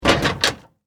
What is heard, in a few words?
Metal Hatch Close, Scifi, Metal, Hatch, Close, Rocket, Ship, Door, Science, Fiction; Spacecraft